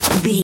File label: footstep snow run